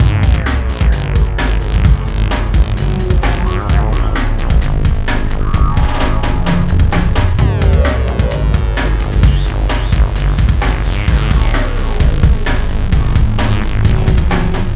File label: Hardcore Breakbeat, 130 Abmin; Big Fish Audio; Hardcore Breakbeat Action; Mirage